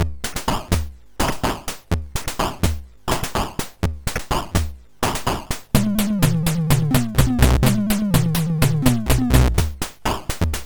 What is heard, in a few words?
Drum, MOS6581R4